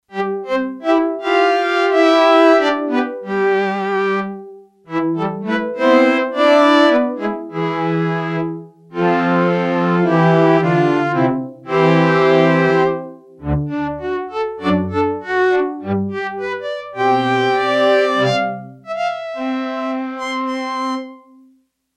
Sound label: New Orchest, Yamaha TX802; Factory Patches; Voices